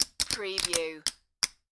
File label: Poker Chips Stacking